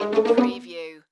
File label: Pizzicato Strings